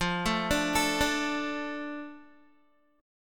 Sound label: F 6th F down Guitar, chord; F6 arpeggiated